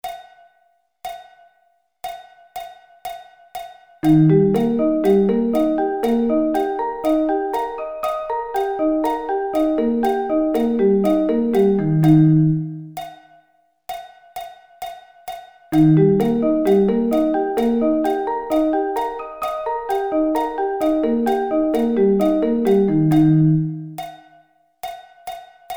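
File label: E flat Arpeggio Inversions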